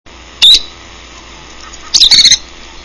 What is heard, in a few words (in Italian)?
gambecchio